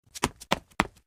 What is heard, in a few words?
footsteps; sonic 2